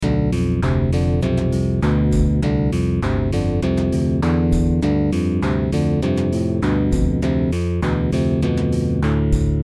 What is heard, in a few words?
Bassy